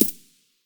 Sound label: aug silencer mono far, weapons; STALKER; IWP